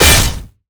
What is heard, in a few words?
Chaos Blade Hit; MG Renewal; MUDevs S20.1-3